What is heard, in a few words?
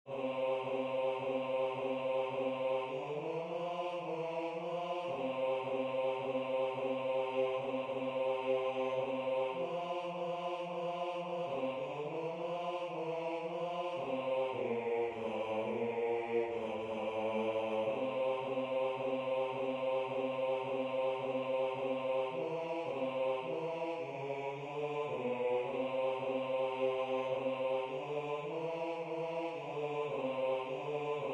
Tenor